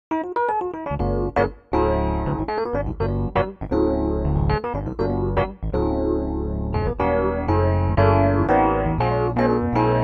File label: EP Bell Pha; dexibell vivo s2